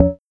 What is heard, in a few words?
Tom High Synth; Electric Empire